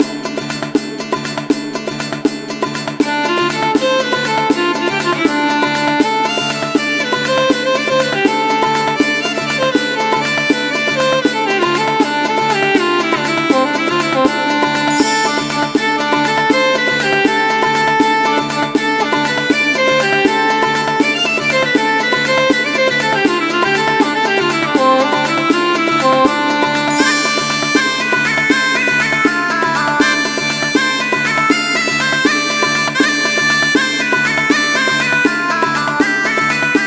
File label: Medieval Party - Lg. Loop; Midas Gold Plus